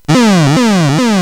Deviants, CSG8580R5

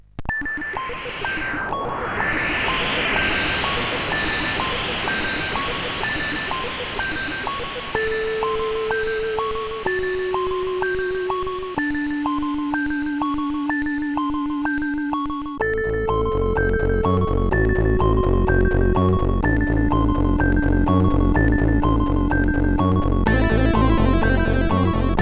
Horror Song, CSG8580R5

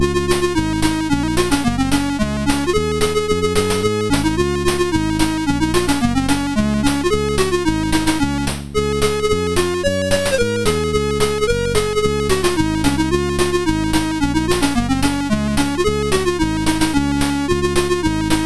sid MOS6581R4, GAMES; Aussie Games

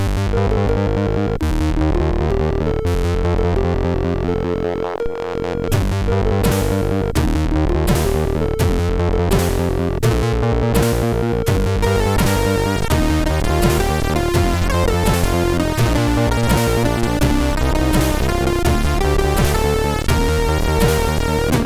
Test Tune, MOS6581R3

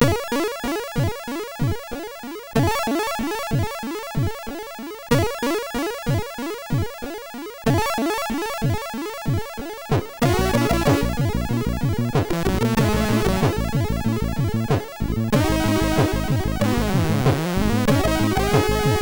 sid, MOS6581; Old Idea; hvsc

